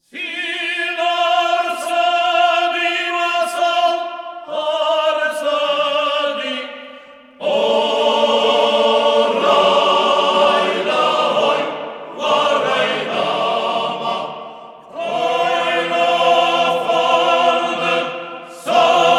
Мужской хор; Северо-Осетинской Госфилармонии под управлением О Джанаевой

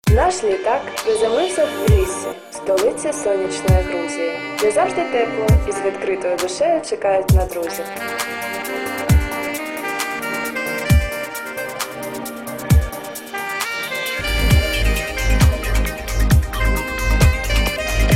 Грузия ждёт друзей (музыка из рекламы); Грузія чекає на друзів (музика з реклами)

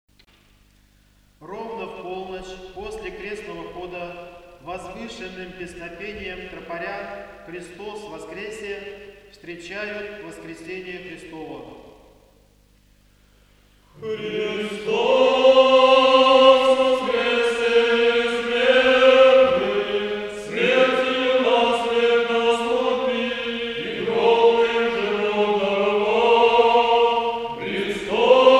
Древние знаменные песнопения в исполнении православного хора; «Трезвоны»

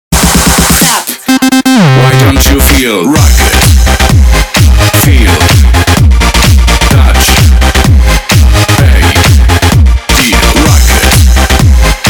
Клубняк супербасс, тест на саббуферы; звук с кс; качает супер бас

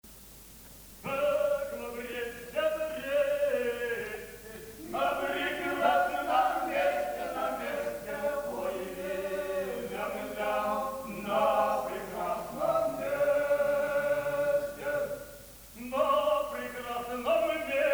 Белгород Красногвардейский район Большебыково село Как во Бресте Бресте

